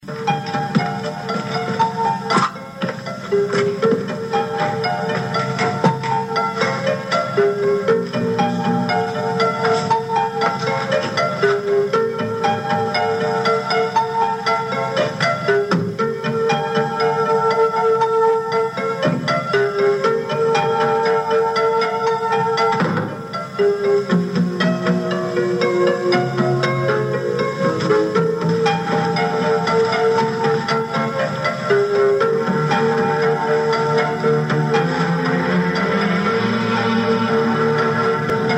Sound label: dramatic theme